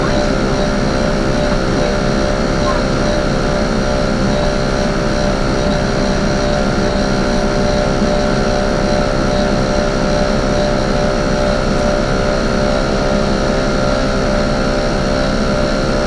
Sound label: ambience